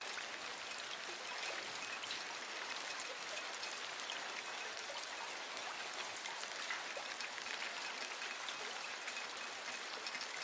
rain city modarate drippy; PMSFX

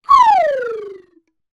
cartoon slide whistle descend with stutter

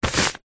paper sheet a4 hand rub flatten on surface; zapsplat

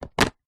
plastic lunch food pot place stack inside another; foley; zapsplat

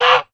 bird goose honk once; animals

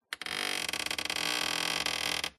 Wood Stress Creak Squeaks; DPA4060; pmsfx

